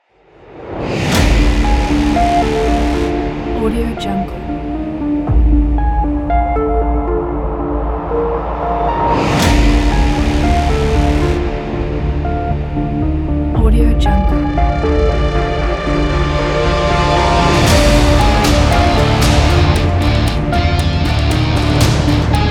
short hybrid teaser

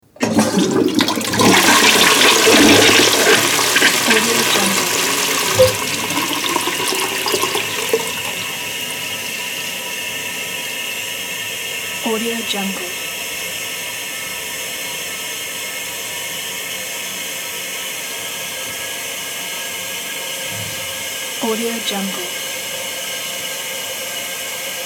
toilet flushing sound

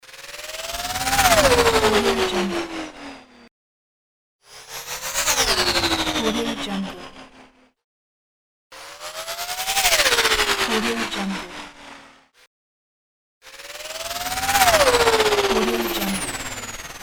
future machine passing by

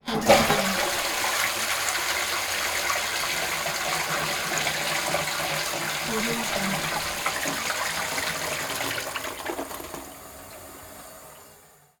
toilet flush

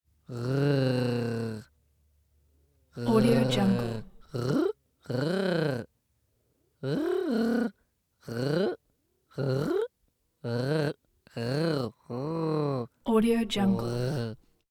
creature voice idle purring growling humming

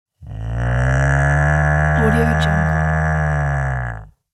cartoon cow moo; applehillstudios

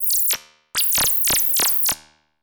computer compute old school

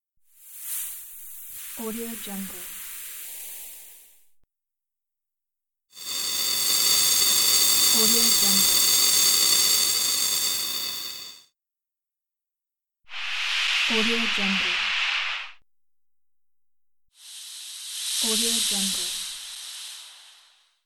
small sand particles fall

